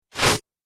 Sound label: Laser Swish, whoosh; sound design